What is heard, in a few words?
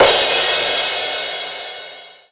Crash, Dubstep One-Shot Drums